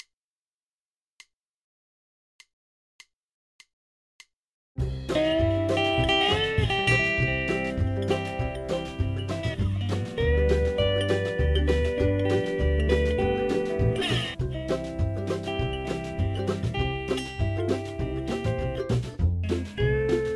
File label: Dobro; Andrew Ev